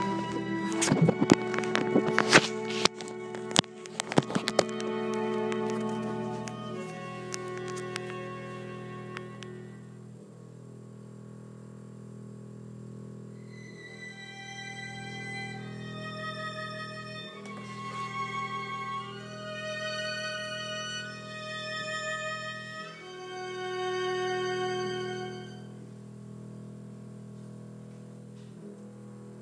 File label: string trio; jazz in highgate; the harrison string trio play a piece by bret kean